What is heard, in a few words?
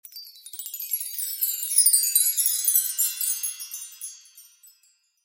reward long; witch hunters stolen beauty windows gamerip 2012